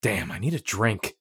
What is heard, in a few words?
dehydrated; Escape from Tarkov